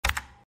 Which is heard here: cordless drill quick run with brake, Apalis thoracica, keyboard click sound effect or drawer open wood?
keyboard click sound effect